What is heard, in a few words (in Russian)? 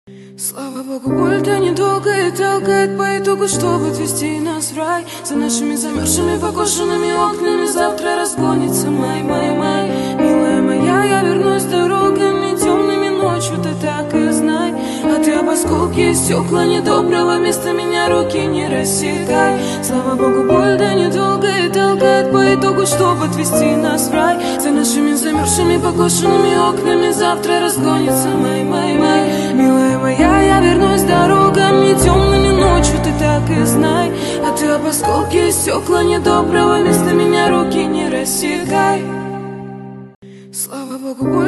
Песня из Тик Тока